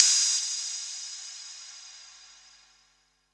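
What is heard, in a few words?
open hi-hat; eddyflux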